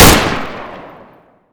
fn fal; garrysmod

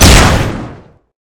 ion cannon shoot; garrysmod; weapons; e blaster

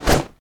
pickaxe swing; garrysmod

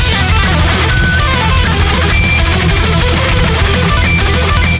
guitar gun solo; garrysmod; weapons; mp5 guitar

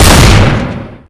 jackhammer; garrysmod

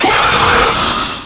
alien death; garrysmod

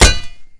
pickaxe hit world; garrysmod

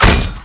nade launcher; garrysmod

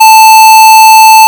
death machine alarm; garrysmod

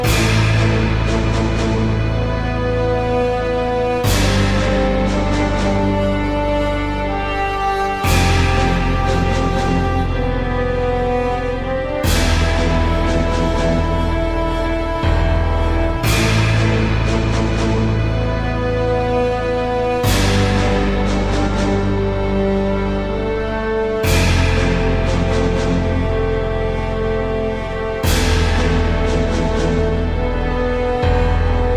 zombie wait; garrysmod

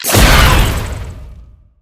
blood explode; garrysmod

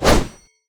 kata swing; garrysmod

